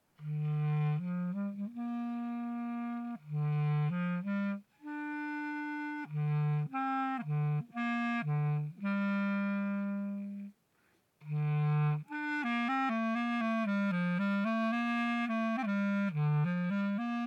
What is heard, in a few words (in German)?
Stand Tief Hoch Ton Spiel